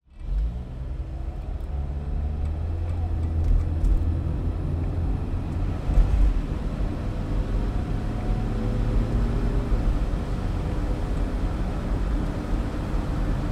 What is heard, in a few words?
car drive wet motorway 60mph wipers on half way stop daewoo nexia automatic